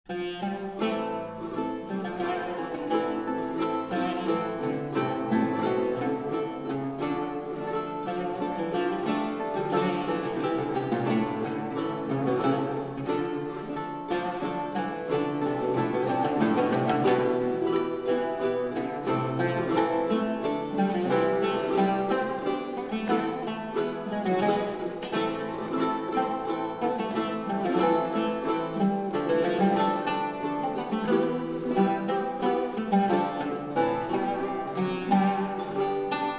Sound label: Danza a tre